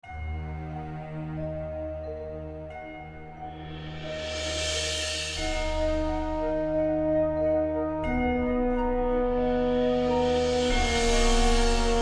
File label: Cinematic Stabs